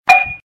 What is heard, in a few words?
pipe